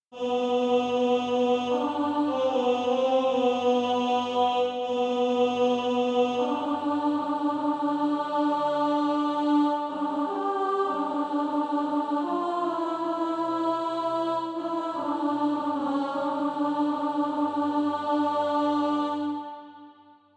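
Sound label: alto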